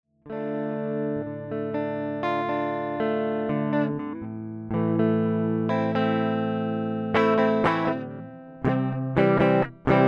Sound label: DI Guitar; Chameleon